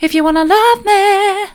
soft, vocal

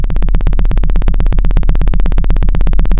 pop, Sound of a helicopter

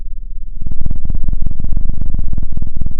pop, Sound of a cat purring

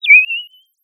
Sound of a bird tweeting nicely

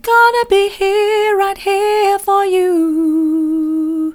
soft, vocal

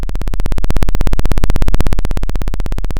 Sound of a chainsaw

